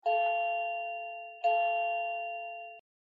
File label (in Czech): melodie